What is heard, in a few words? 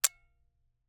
Camera, Aimex SP500, point n shoot, plastic, snapshot; Eiravaein Works; Vaeyan IV